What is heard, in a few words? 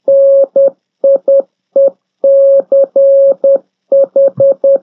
MORSE